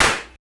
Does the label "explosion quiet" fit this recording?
No